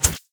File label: basic attacks; Zyra